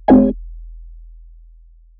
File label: Snares, Alesis Performance Pad